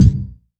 C1, synth line; 90 s Sample CDs; NiceBeats; SynthLine VOL-3

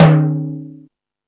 Latin Drums, E-MU Pro-Cussion; drum machine

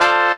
Horn Houz Chord Dm7; Proteus 2000; Digital Sound Factory Proteus 2000

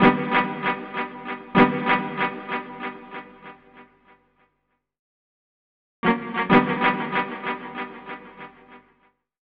Cuckoo, Guitars; Zero-G Smoke

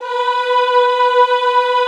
EHH B3, Classical Choir; Best Service

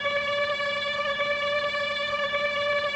ORCHESTRON D3; Sonic Reality; Vintage Timetraveler

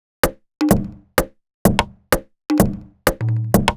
127bpm, House Drumloops; 90 s Sample CDs; Zero-G House Fabrik Drumloops; ZG Drumloops

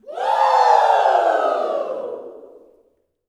"WOO", Gospel, YELL SHOUT; ILIO; Vocal Planet

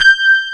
G5, Piano, ELECTRIC; AKAI S6000 CD-ROM - Volume 1

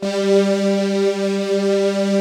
STRING SOFTS; Pro-Rec - Synthsonic; Z1 PADS

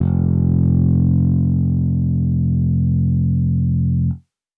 Dusty Analog Bass F, Bass Guitar

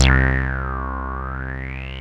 SYNTHACON, OLD SYNTHS; Rubber Chicken Software Sample Bank

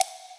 Wood, Block Stick; Equipped Music; Loungin House

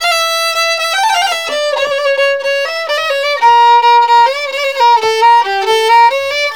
VIOLIN; USB Soundscan vol.63; AKAI

